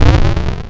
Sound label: rumble; cavernsoffire